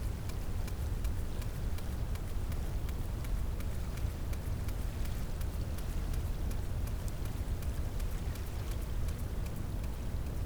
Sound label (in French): cachalot positif, Physeter Macrocephalus